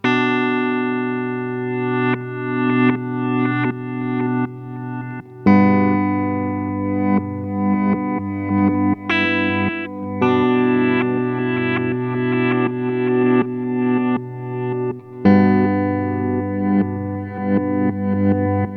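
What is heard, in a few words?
Microcosm Pattern Filter; Hologram Electronics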